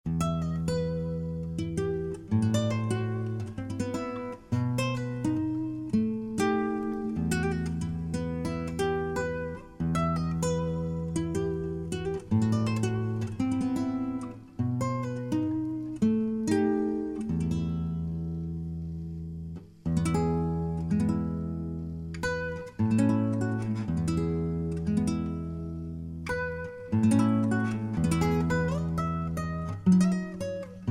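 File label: Spanish, acoustic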